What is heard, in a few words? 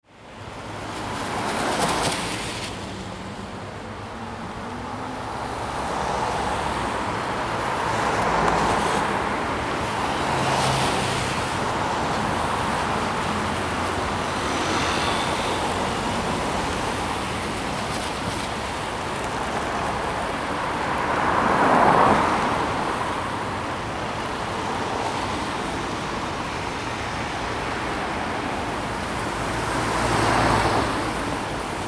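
Khreshchatyk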